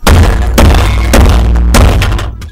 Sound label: four beats